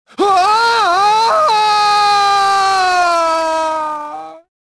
falling; jedi

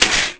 roar

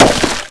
break wall

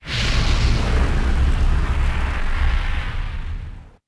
JETS

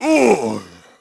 DEATH, VOICE; Pulse Racer

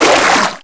water, footsteps; characters

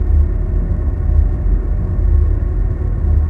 hangar; Star Wars Jedi Knight II Jedi Outcast; XBOX; ambience; yavin

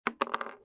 casing wood; bullet impacts; Soldier Of Fortune II Double Helix; XBOX

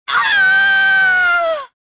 falling; mothma

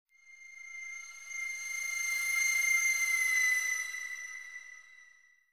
MUSIC pipe; Myst III Exile

